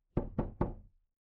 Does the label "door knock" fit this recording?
Yes